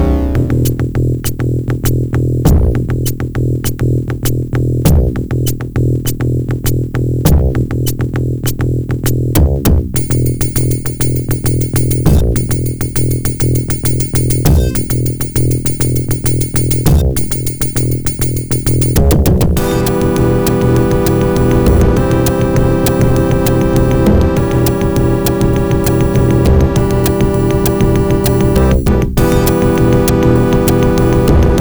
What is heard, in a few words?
SB Audician32; doom2